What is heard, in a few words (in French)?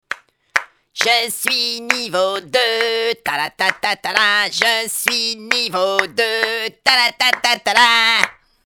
Sonnerie; niveau du nain